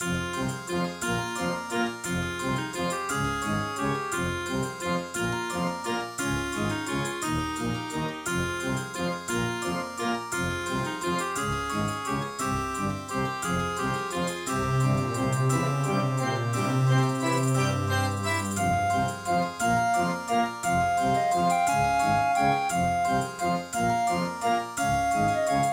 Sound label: Track 6, Yamaha MU5; Death Gate